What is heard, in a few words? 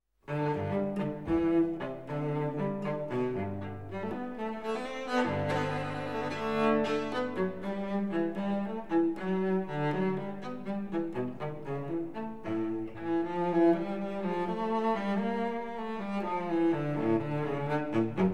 suite violoncelle gigue